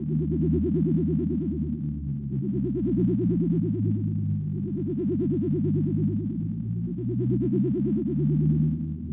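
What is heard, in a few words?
display screen loop; Star Wars Obi-Wan; XBOX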